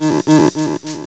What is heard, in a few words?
TALK DRUM; CMI Sound Library Rev 1.3